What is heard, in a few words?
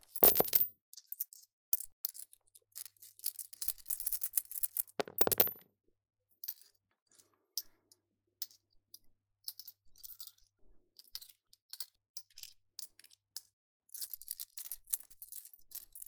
coins - jingle, drop, clang; dice, cards, game related; NS Studios - ultra sound collection